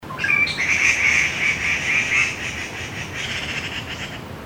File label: Gray Treepie, birdcall; Dendrocitta formosae; Bird Call Recordings